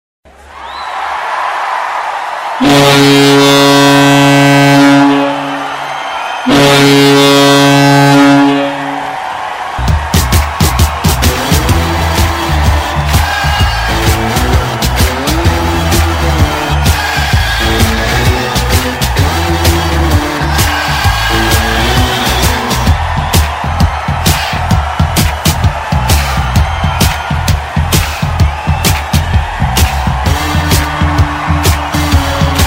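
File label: siren and joyful shouts of the crowd, goal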